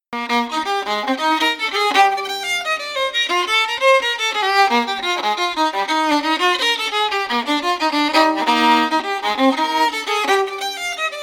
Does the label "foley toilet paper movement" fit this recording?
No